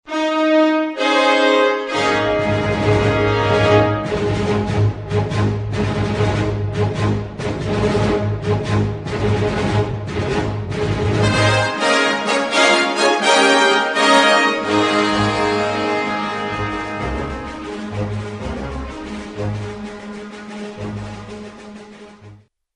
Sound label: Open; Nightly News 2004